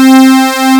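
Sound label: WAVE SQUARE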